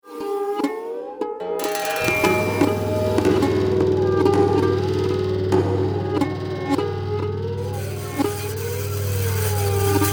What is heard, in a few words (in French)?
Harpes